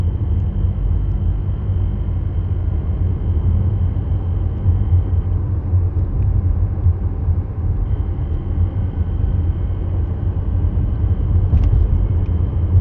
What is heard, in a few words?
electric ticking sound, cx 5; 2020 signature